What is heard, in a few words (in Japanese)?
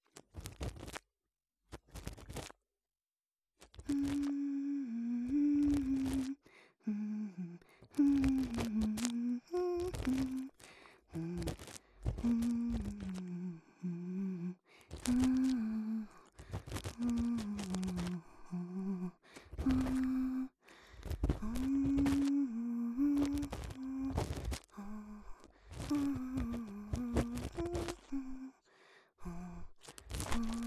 左右反転 鼻歌耳かき